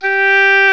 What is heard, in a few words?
oboe, Orchestral Winds